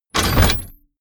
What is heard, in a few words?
sci-fi plasma cannon reload